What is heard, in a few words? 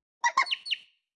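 Chicken baby, 部署音效; 母鸡; 卡通农场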